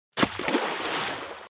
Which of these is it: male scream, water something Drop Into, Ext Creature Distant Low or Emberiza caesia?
water something Drop Into